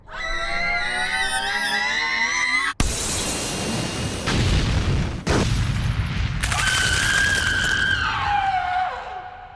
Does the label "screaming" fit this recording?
Yes